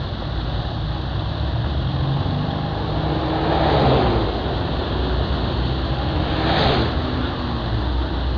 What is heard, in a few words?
ROAD